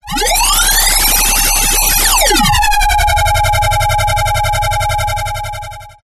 sound design frequency modulation stuttering vowel tone with high pitched whine